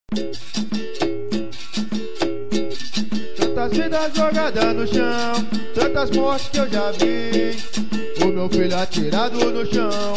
capoeira; Capoeira Tribal

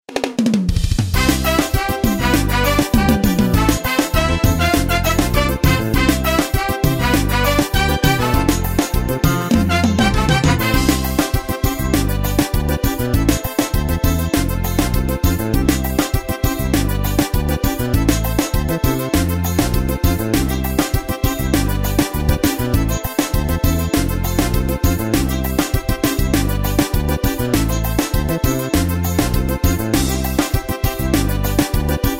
forró playback

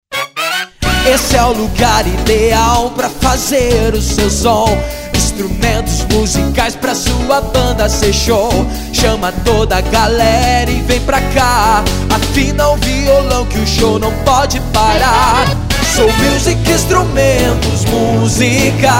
soul music instrumentos musicais

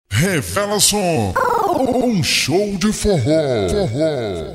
vinheta

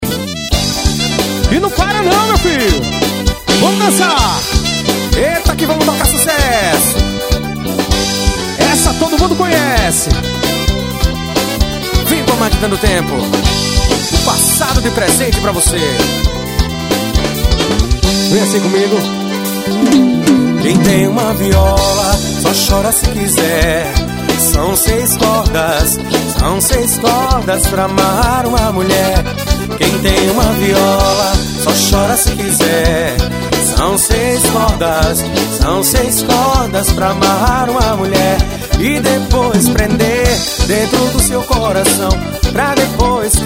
forro seis cordas

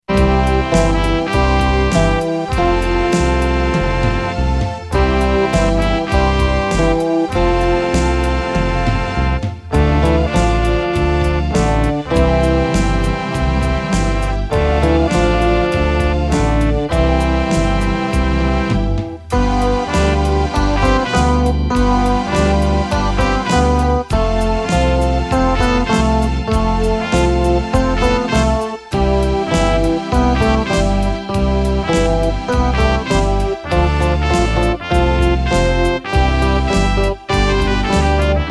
piano musical alegre

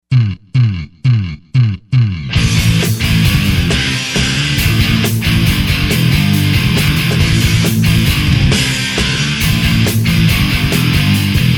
batera voz

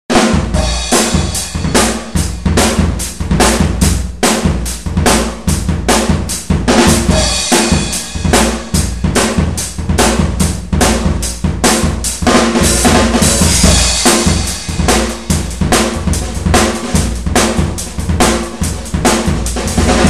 aplicação groove de batera

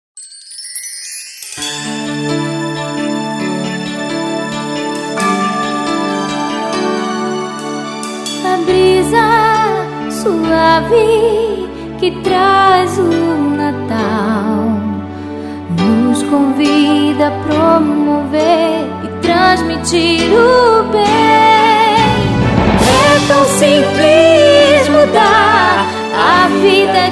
jingle de natal; paulus livraria; canal do som

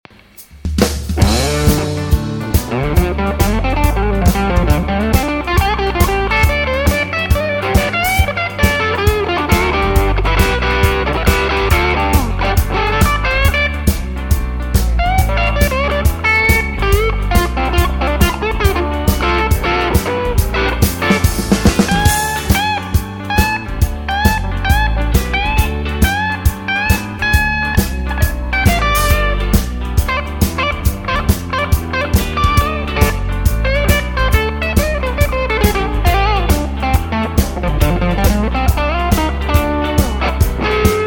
improviso blues

